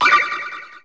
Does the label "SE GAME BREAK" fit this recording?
Yes